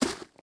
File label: grass step; Golden Crayon Sounds